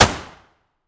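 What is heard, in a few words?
weapon dmr sup fire player atmo int; gmod; viper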